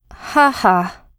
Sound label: laugh; Eternal Return; Katja; ENG